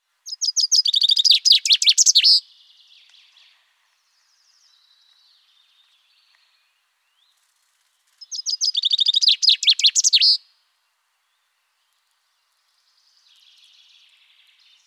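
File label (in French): Pinson des arbres, les oiseaux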